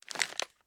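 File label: pistol reload mags end; gmod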